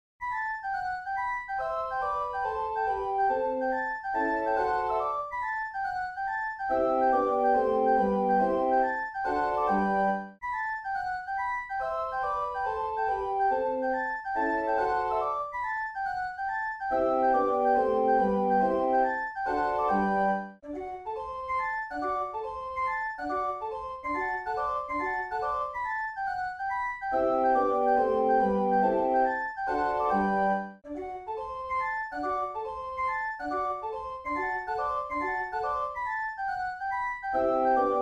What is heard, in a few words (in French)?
Mouvement Flute Ou Horloge Musicale